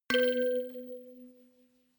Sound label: notification kalimba rattle octave low